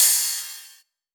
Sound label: Rides, Cymbals